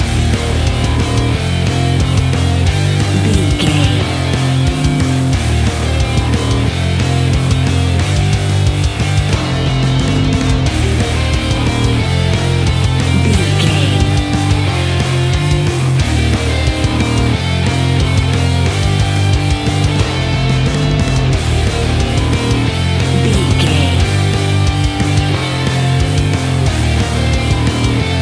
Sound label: action punk heavy rock epic music; Action Heavy Rock Epic Music 60 Sec; Catch 22 Music Network